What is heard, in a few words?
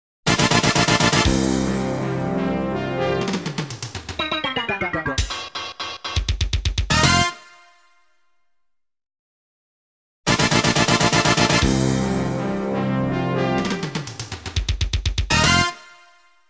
Station Call, Sounders, Bumpers; Sounders, Bumpers, CUES & IDENTS; DennisMusicLibrary